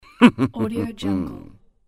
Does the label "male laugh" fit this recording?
Yes